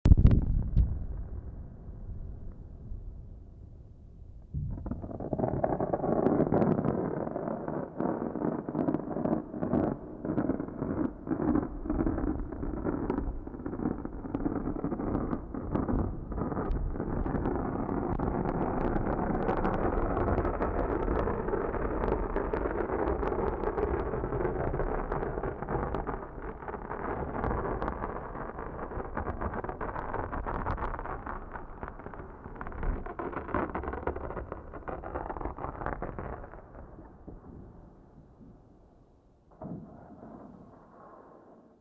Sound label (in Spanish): mas truenos